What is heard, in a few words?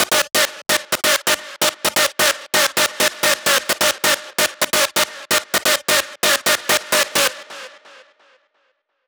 TECHNO SYNTH LOOP WET D 130BPM; TECHNO PARADISE